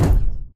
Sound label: Footstep Jog Mid; Titanfall 2